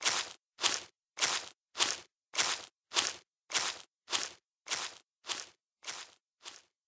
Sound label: footStep footStep